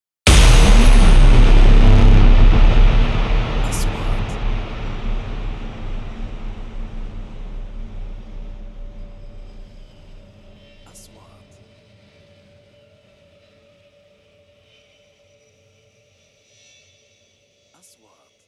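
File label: 3D SFX Trailer; مؤثرات تشويقية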